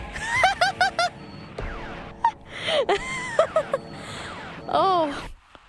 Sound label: laugh